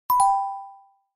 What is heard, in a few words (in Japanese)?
クイズの正解・不正解